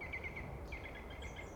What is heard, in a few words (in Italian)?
la risata del Picchio verde; Natura Mediterraneo